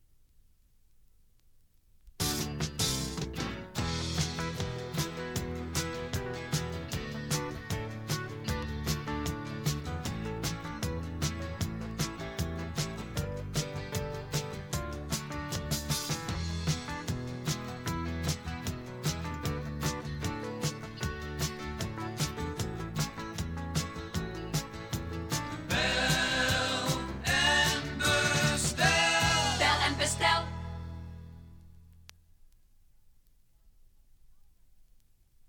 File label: topformat maxi jingle single